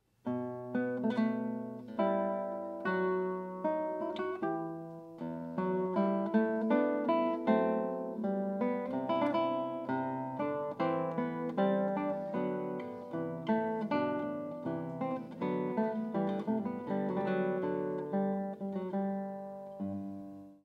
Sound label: Courante da suite 996; Bach